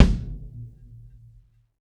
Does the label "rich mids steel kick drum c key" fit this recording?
Yes